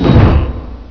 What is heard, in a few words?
door stop